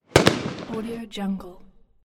firecrackers

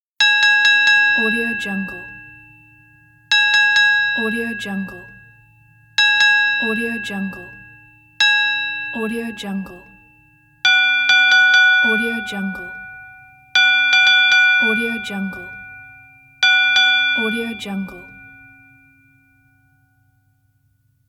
boxing ring bell

